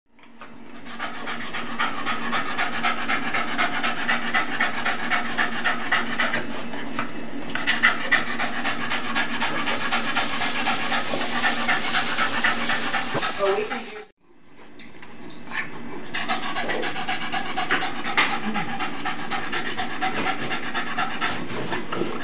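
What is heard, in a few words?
pants, panting, dog; not like the pants he wears, but rather, panting; in wonderful Spring, TX; Seymour; Spring, TX, a quaint, dainty little suburb northwest of the most polluted and fattest city in the United States, Houston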